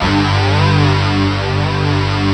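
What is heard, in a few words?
distort, Fairlight; East West; Global Sounds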